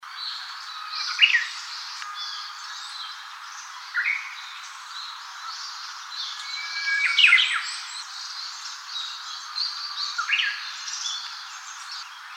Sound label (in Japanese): uguisu